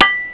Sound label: bell